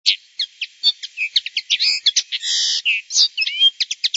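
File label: Linnet European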